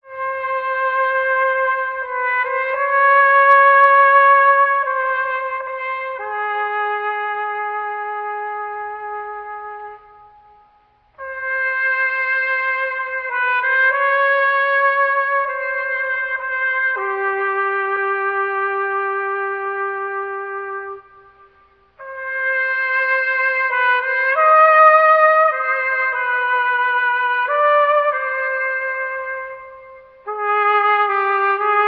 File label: horns, Loops, Sad